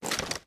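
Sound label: sniper reload empty arm; gmod; iw8; alpha50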